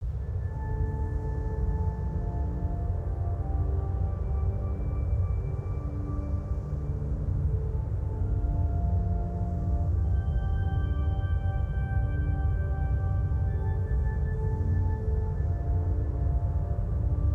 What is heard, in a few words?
Ambient Chasm